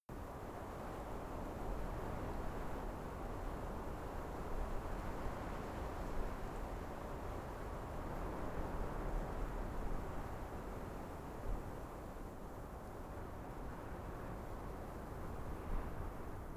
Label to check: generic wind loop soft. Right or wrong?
Right